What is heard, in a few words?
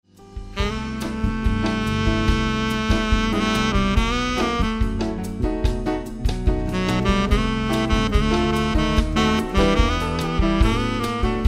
Meditation